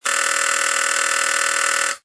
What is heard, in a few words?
vintage office phone, old phones